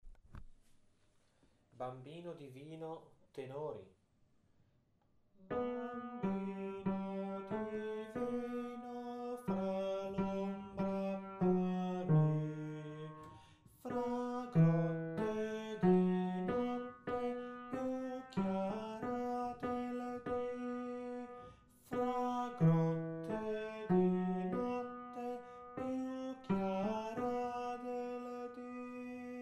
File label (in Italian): bambino divino tenori